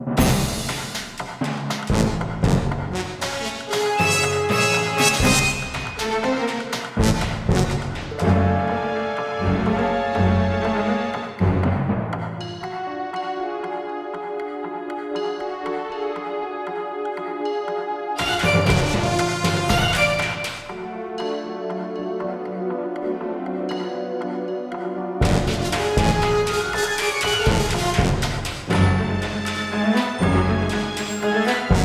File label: Fight; Tex Murphy: Overseer; Clocktower Fight